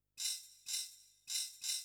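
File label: percussion, house, 125, drum; eJay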